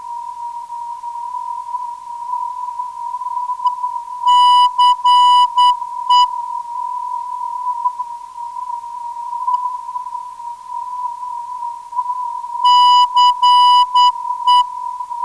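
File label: 372kHz, non-directional beacon; NDO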